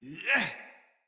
Attack Heavy, Battle Barks; Envoy

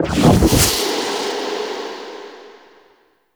Evil Twin Warp; Gameplay; Warframe; Chimera Prologue

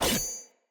Axe Whoosh Stab; Weapons; Enemy; Axes; Glades Axe

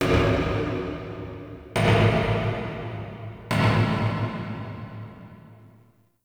Knocks Small; Warframe; Ambience; Entrati

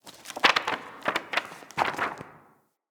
Station Misc, Ambience; Soul Plane; Orlick

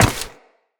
Step Ice, Footsteps, Walk; Characters; Tenno

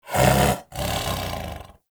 Snow Critter Vocal Snore; Hunting Critters

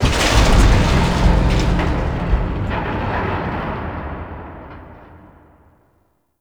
Void Dragon Move Long; Warframe; Duviri; Movement

